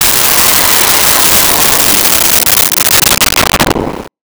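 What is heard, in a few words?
Missile Fly By, Weapons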